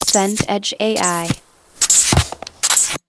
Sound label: footsteps snow